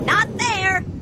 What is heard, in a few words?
grunt; h3dialogue; staten